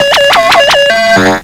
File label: alarm; Ueberschall; Techno Trance Essentials